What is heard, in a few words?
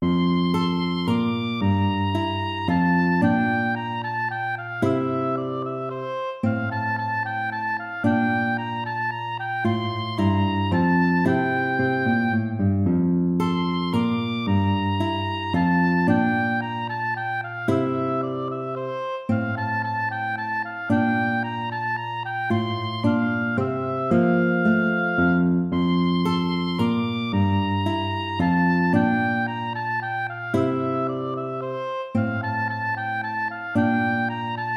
minuet major